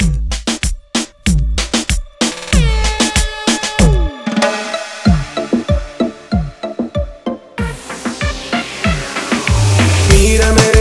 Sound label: Acapella